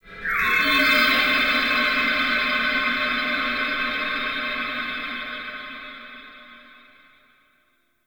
Modular Texture, Ambience

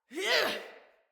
Attack Heavy Female; Battle Barks; Envoy

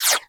Laser Whizzby; Warframe; Bullet Impacts; Whizzbys

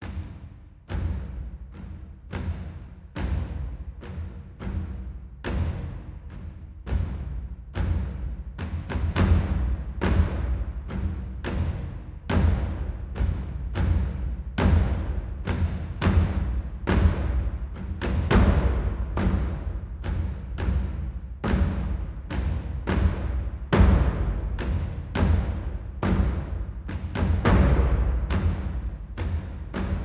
Hubs Hangar Amb Cue B; Tenno Hangar

